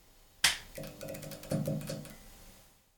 Fluorescent start

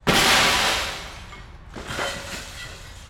Breaking Window

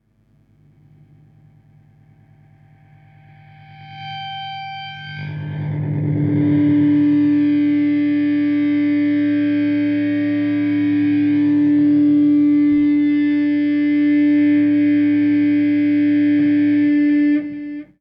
Subtle Intro Into Deep Droning Feedback

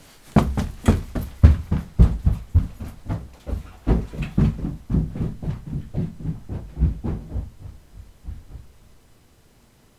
Man Running Downstairs In A House

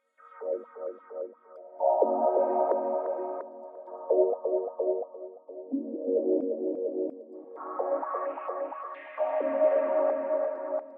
Icy Sublimation

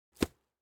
Jump To Grass

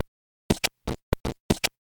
Loop glitch

